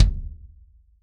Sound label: Kick; Ableton Live 12 Lite; Core Library; Drums